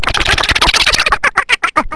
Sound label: frizzle